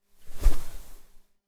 quick fabric swish

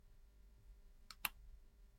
mechanical button press

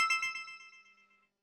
digital notification chime

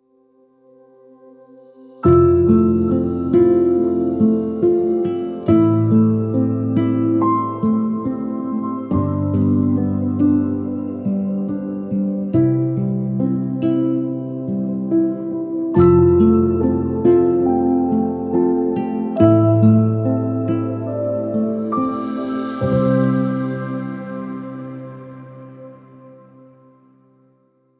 reflective sunset strum

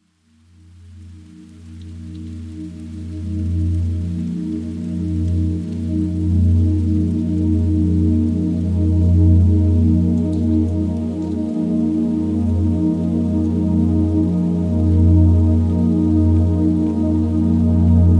ambient serenity

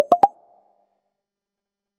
bubbly message pop

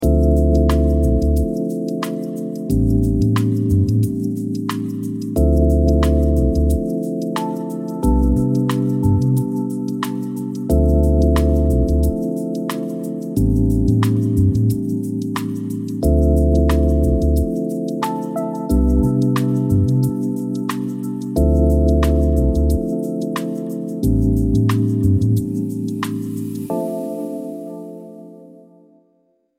mellow lounge drift

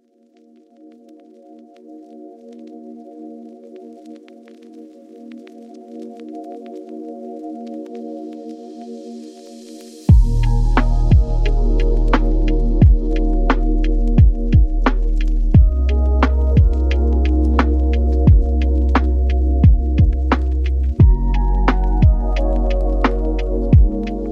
digital haze

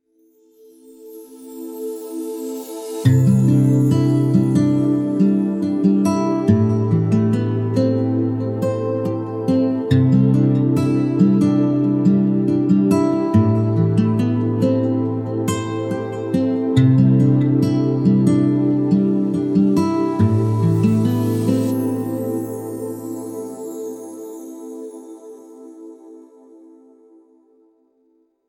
reflective guitar pad

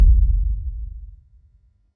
deep concrete boom

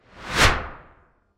light up swoosh

